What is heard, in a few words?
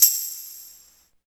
TAMB; East West - Phat & Phunky CD2; 90's Sample CDs